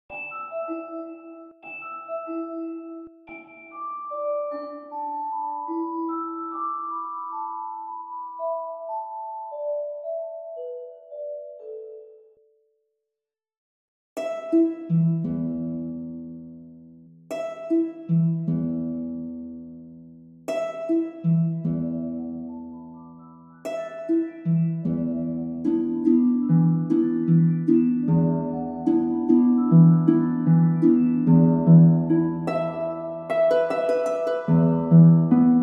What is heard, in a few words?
Camp Harp B